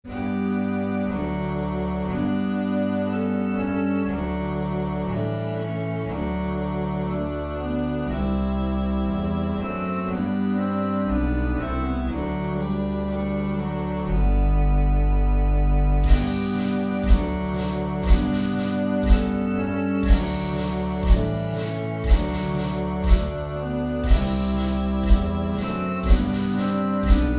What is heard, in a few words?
Pavane; Baron Calums Pennsic Pavane